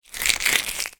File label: office pencil sharpenings movement in hand